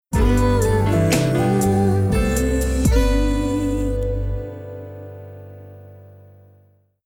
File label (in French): voix chantees, publicite; Liebig